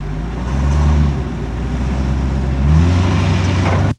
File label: Cadillac Backs Up Re